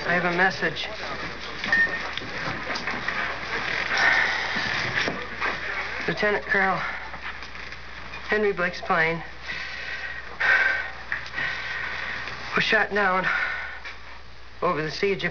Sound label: plane